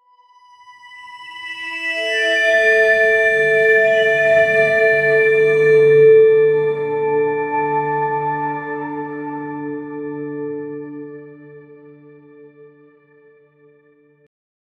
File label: an evocative 30 second in, emotive; SFX Engine